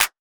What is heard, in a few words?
Main Room Clap; essential drumkit